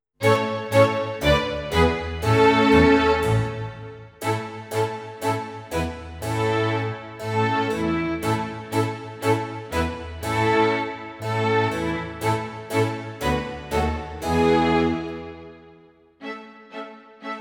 autumn (backing track)

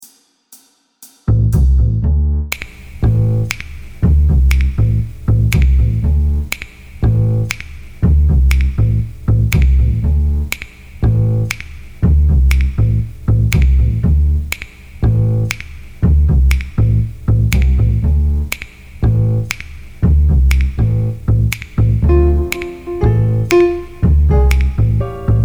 jazz backing

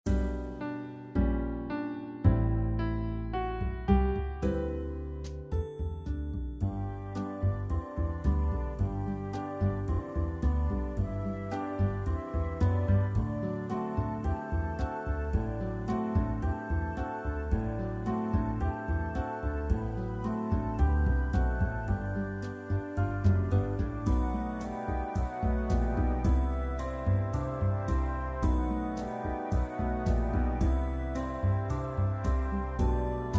lazy sundays backing tracks

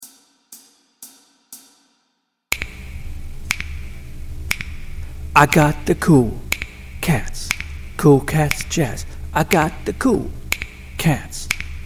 jazz vocal

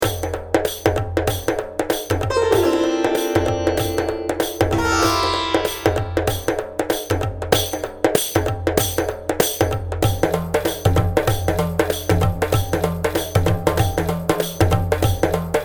backing

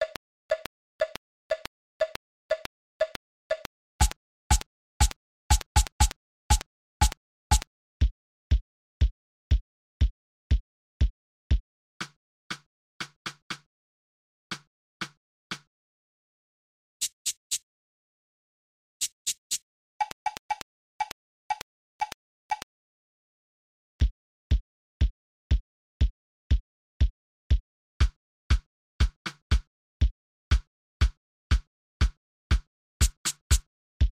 samba

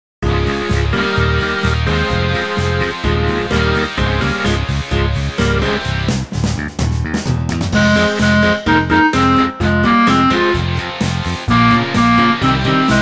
rock

